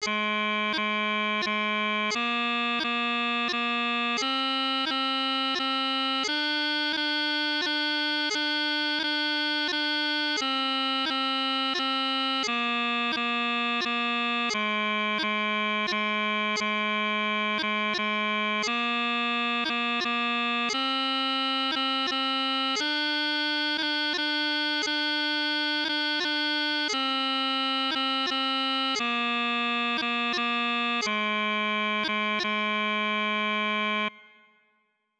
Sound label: Triplets, piping